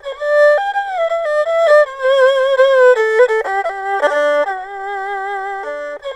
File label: ERHU 90 C; INIS - Opium